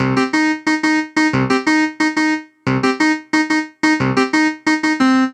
Melody

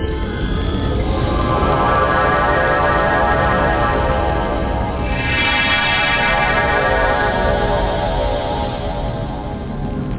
BEAMSND

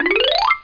morph; Let's Talk About Me!

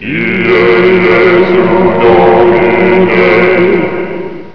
Shrine Shazam; Ultima III

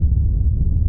tank engine; StarSiege

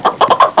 chicken; Chicken2

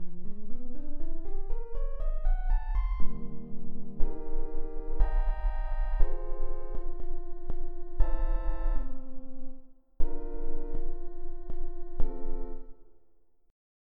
Rumble

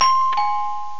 DOORBELL

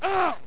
C damage; landslor

